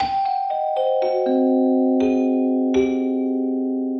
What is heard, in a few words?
Ferric Mallets, 120bpm, C